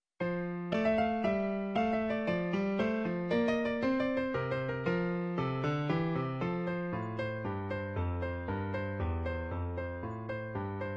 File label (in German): Menuet Triolen, Klavier; Bach